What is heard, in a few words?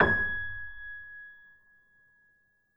Grand Piano G#5 p; Ableton Live 9 Essential Training